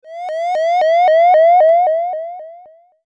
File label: boss siren; neon harrier